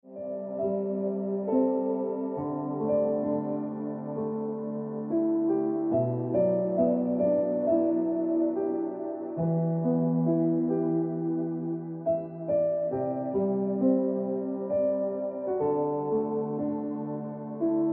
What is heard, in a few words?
Piano Music